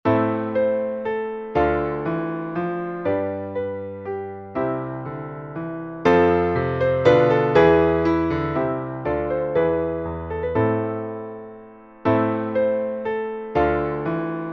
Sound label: MINUET in A minor; Johann Krieger